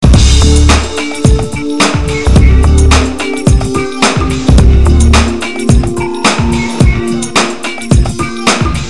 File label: depressing rock music